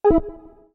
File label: button